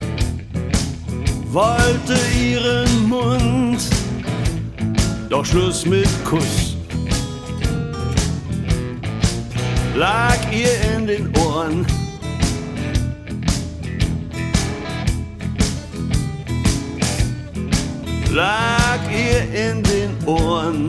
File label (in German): zug blues